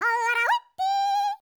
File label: YODEL, World Voices